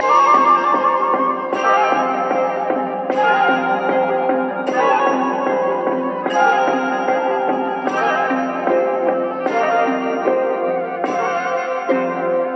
flute, Jazzmatazz Loops

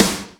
ensnared, snares real

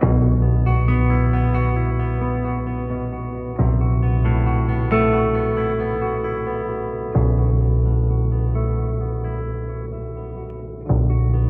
guitar, Loops, Sad

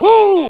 "woo", voice

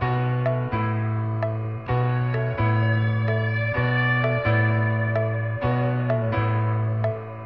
piano, Phonky loops

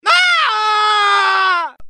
nahoooooh, human; ooh ahh

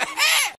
cough ha; ooh ahh; human

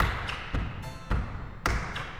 classical rap; Drum loops

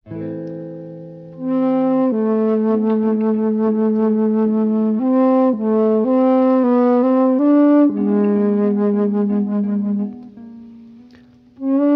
flute, Loops, Sad

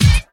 Stabs

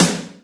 snare, Real

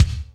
mouth, Kicks Loud

